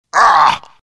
pain; skeleton king

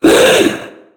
pain

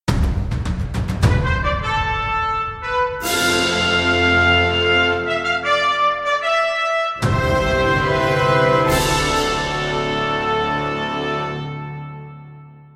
stingers dire win; ti9

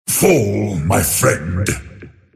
deny pain; Earthshaker; arcana

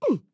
Grunt Fast; Realm Royale; Mage; Radon Flux

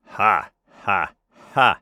Assassin Laugh; Six-Shot Cyborg; Realm Royale

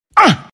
pain; omniknight

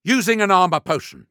Using Armor Potion; Realm Royale; Assassin; Sly Scoundrel

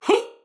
Grunt Hit; DarkLord

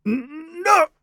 Grunt Jump; Warrior; Hi-Tek Trooper; Realm Royale

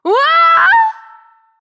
Falling; Seris; Paladins

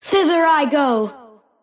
move pain; Invoker

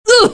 pain; windrunner

